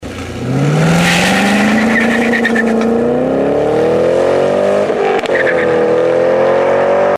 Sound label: corsa launch